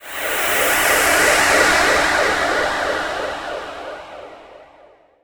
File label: Fall, Hits & Falls; Zero-G Trance Inducer